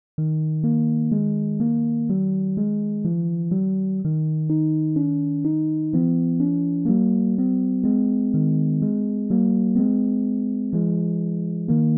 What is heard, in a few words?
24edo Mohajira7 Lullaby; Nick Vuci